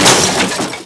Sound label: light hover death; toyspace